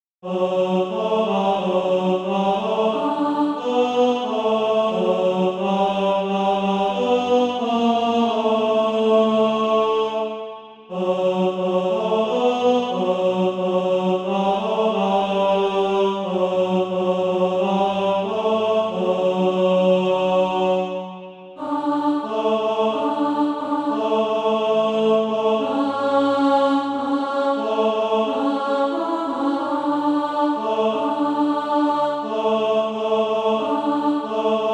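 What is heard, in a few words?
tenor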